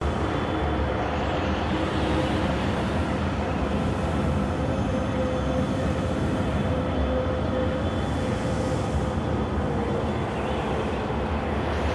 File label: BGM Environment Temple; God Eater Resurrection